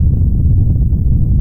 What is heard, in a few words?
Quake; Life of a Naomi; bgs